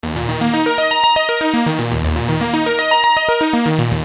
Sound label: Arpeggio 120 D#, Techno Synth Loops; Sonic Foundry (Sony Creative Software); Signals I